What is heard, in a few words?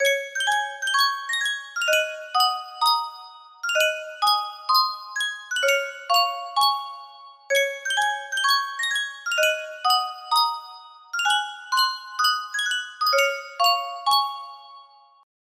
Yunsheng music box melody